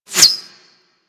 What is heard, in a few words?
Arrow Whizzby; Warframe; Weapons